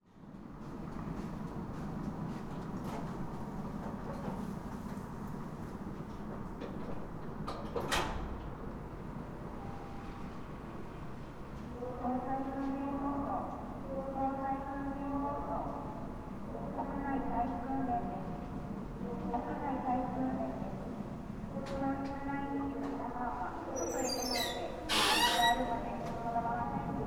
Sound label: evacuation training